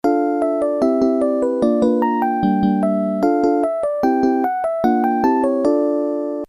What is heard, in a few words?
flute; sega gus wonderboy